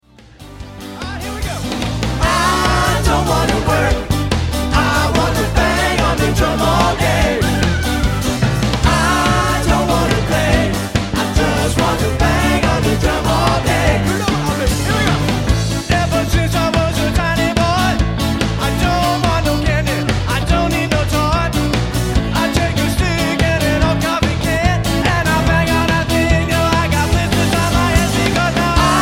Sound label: bang on drum